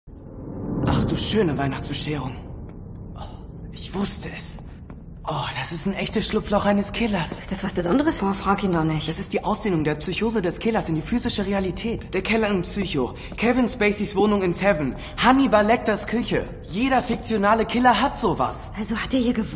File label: scream